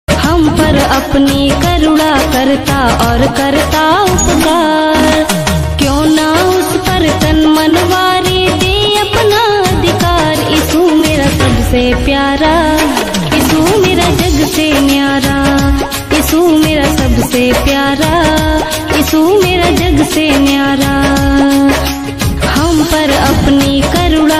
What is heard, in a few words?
jesus call ringtone new song; yeshu masih